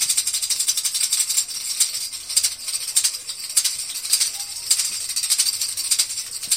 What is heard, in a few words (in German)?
2 rasseln